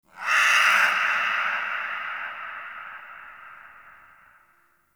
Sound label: Ext Creature Distant Mid; Warframe; Infested Eris